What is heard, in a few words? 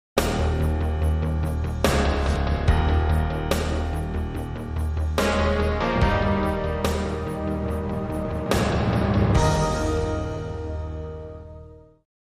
Synth